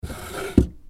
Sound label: Small Wooden Drawer Open